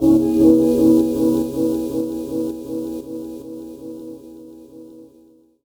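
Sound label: Pad Synth; Equipped Music; Loungin House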